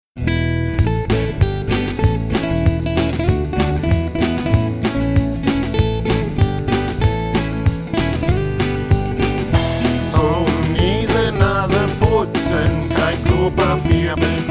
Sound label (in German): pipel popel lied